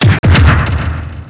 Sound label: BLAZING FLAME BLAST